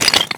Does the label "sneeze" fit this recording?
No